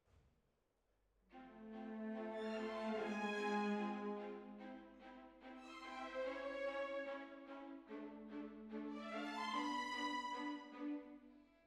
Hall

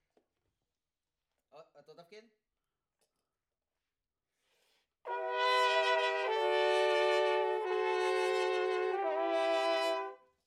Trumpet Room

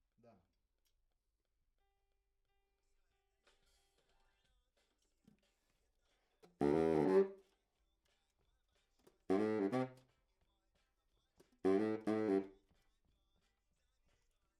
Tenor, RNR for piano

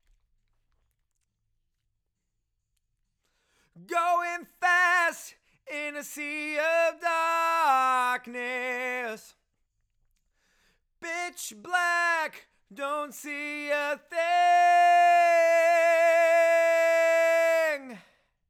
LV Choru

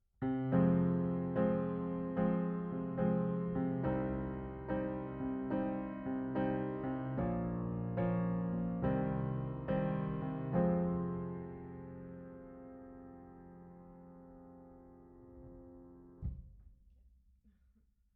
Piano Mono

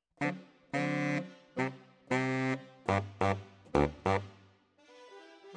Bari, RNR for piano

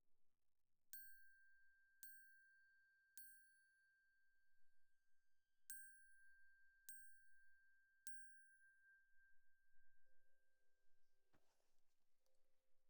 Glock Close

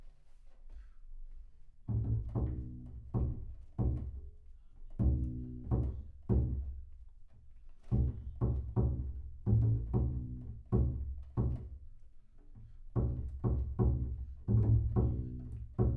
Bass Room

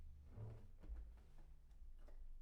Bass Room

